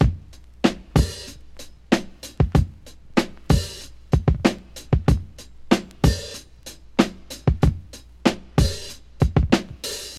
94 bpm fresh drum loop d sharp key, Free drum groove - kick tuned to the D# note.